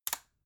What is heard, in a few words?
magnetic plug; objekte